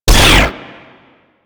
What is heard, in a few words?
Cryo Tool Fire Mono; Warframe; Gadgets